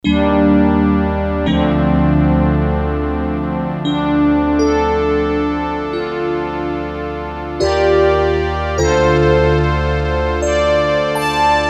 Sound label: E.P Strngs, Korg DS-8; Factory Patches